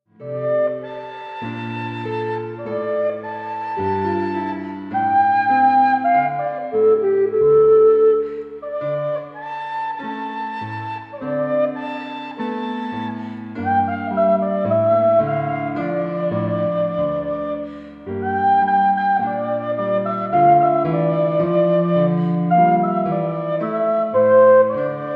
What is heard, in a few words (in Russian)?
Музыка; Детские пения